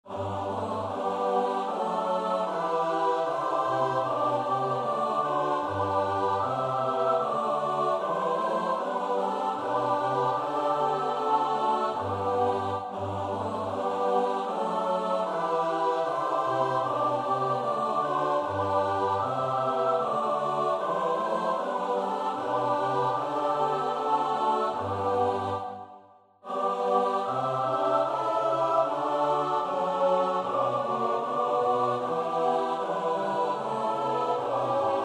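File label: soprano alto tenor bass; Bach (Johann Sebastiaan); BWV 099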